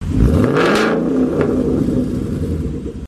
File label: Mustang; 2013 Roush